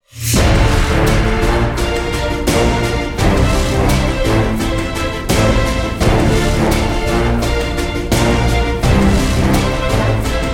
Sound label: Exciting and Epic Sting